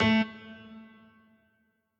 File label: piano riff intro